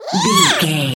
goblin fairy attack

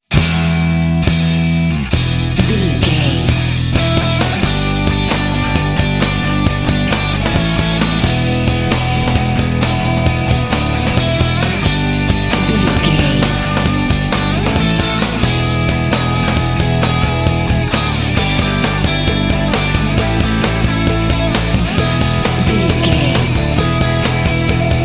indie thrash rock full mix

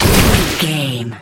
water blast projectile spell

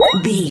ui menu button beep

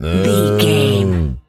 cow moo

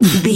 voice male b effort quick action

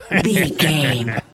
voice fun man character deep laugh

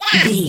goblin fairy attack low

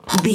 chair frame metal creak squeak

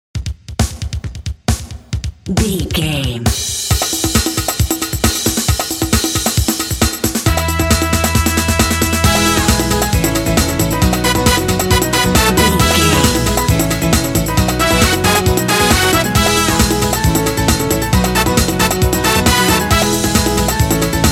latin bliss

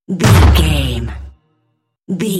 cinematic stab hit trailer

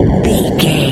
retro spaceship jet boost

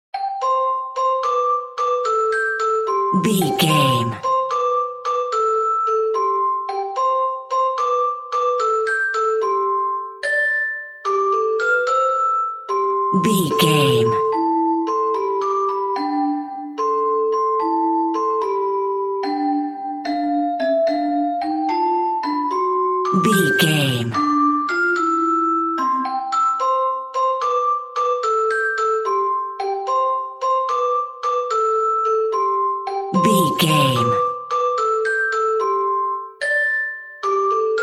Pop Goes The Weasel French Celeste

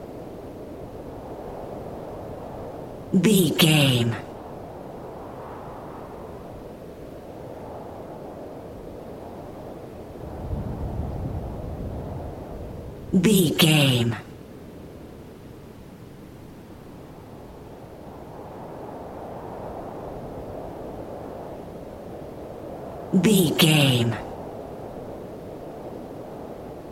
wind general soft low loop

